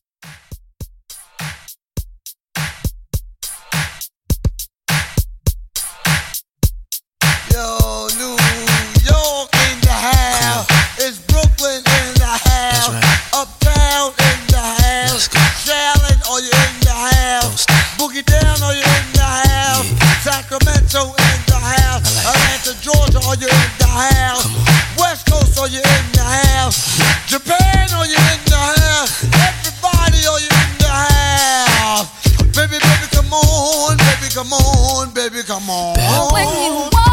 Remix 90s RnB Re-Drum 103

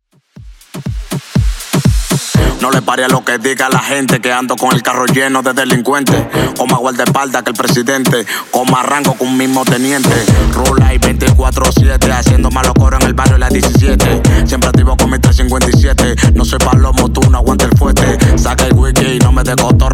Latin Reggaeton 121bpm

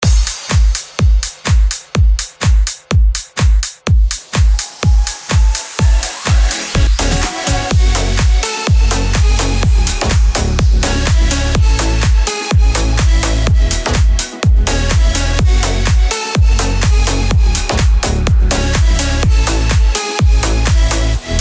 future slap house 125 bpm

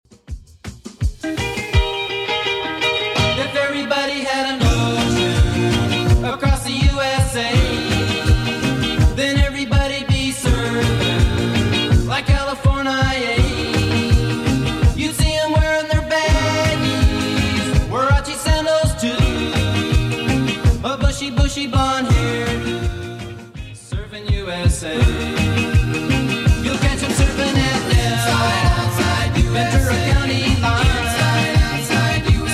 70s Redrum 165bpm

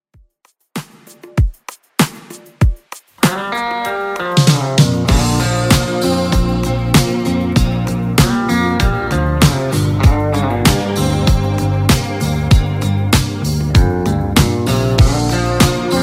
80s 97bpm; Redrum

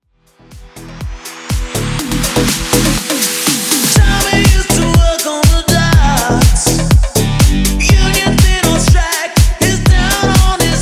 Bootleg House 122bpm

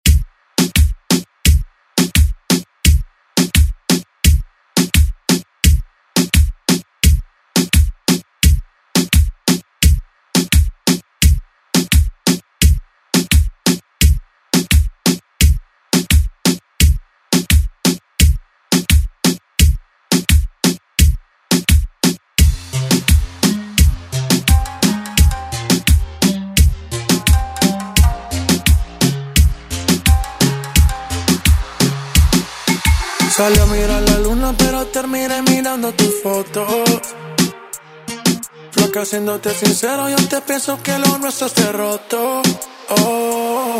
Latin Urban Redrum; Deejay Hit

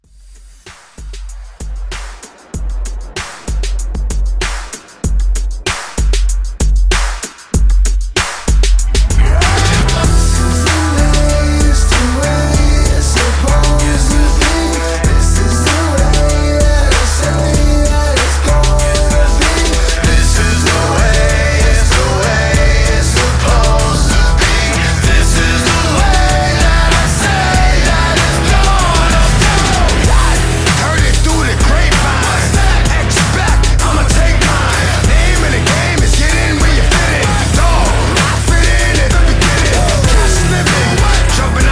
Mixshow Re Drum

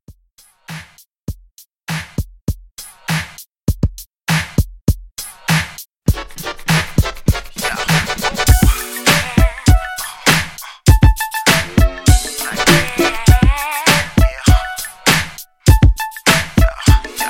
Throwback Pop RnB Re-Drum

